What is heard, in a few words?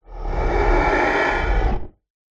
horror demonic growl huge growl wide